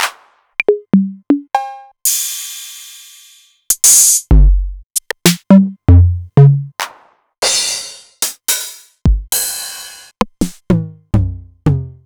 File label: Novation Drumstation; DrumstationV1